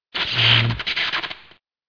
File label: electric shock; ss13